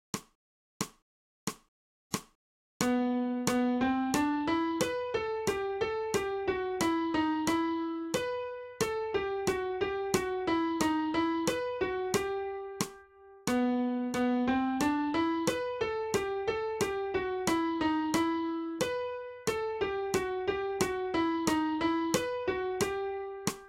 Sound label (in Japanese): 新フレットレス